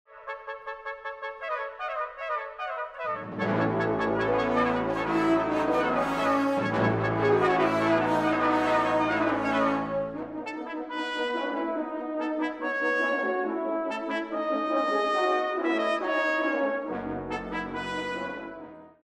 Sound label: Blast of Brass